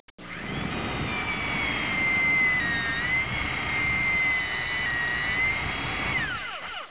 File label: drill; Image Bonanza